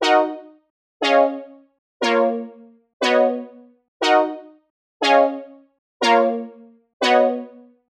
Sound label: Stab Pop Chords D#m 120; Melody; Keyboards